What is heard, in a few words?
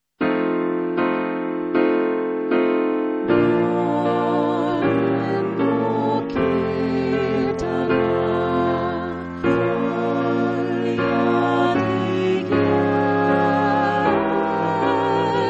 tenor